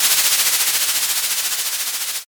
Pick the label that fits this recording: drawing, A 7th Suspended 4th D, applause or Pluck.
drawing